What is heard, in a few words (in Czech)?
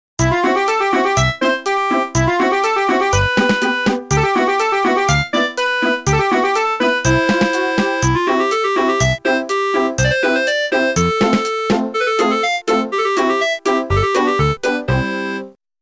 melodie